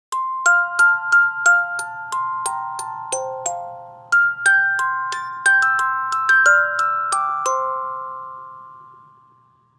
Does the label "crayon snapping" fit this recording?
No